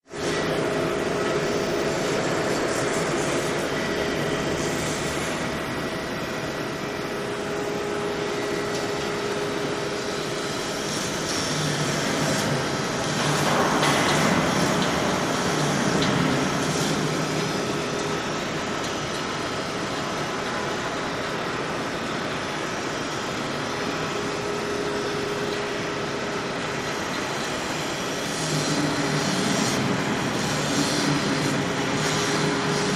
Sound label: Industrial Amb Factory